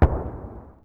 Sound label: distant gun; WAR